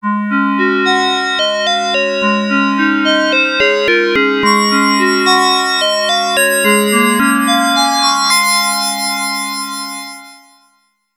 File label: Continue; Xain'd Sleena